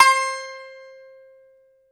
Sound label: BAGLAMA, Plucked Strings